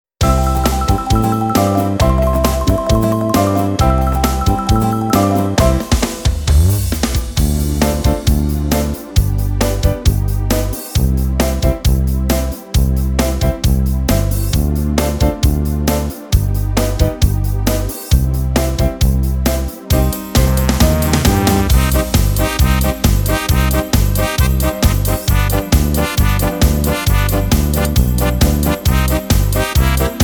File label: Beat